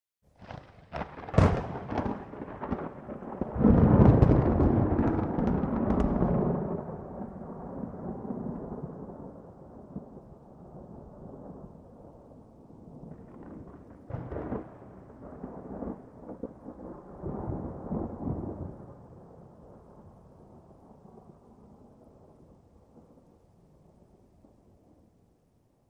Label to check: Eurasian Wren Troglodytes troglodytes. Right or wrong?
Wrong